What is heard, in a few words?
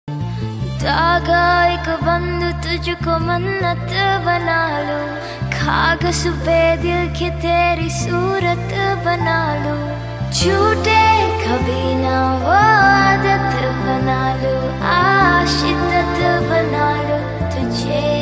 title track female version, hindi ringtone